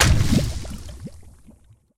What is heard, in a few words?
Bubble Splash; Game rip; MiSide